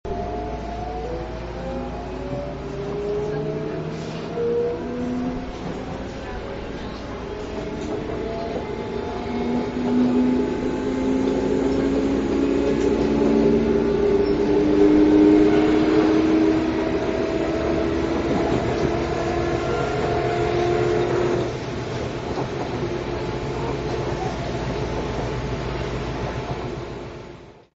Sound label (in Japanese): densya